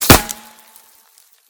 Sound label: dirt, bullet collide; Anomaly Bullet Cracks and Impacts Redone